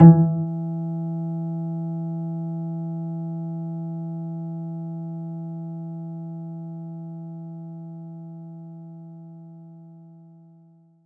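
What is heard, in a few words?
Acoustic Bass G sharp 3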